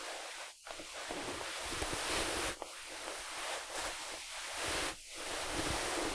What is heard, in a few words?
cloth; mansion